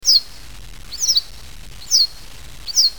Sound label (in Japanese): hakusekirei